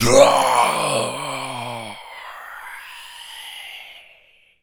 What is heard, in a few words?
Death, Zombies; zps; Carrier